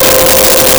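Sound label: Synth Brass C5; Nintendo; July 2020 Gigaleak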